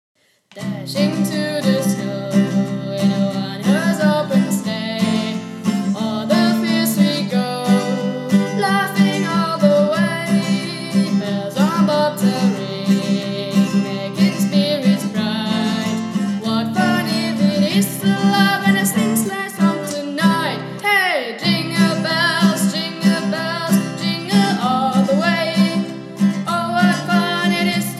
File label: Jingle Bells